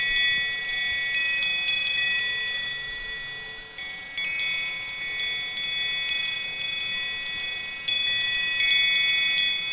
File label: Cardinal Windharp Chime